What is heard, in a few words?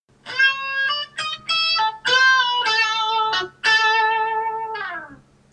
bent 3rd chromatic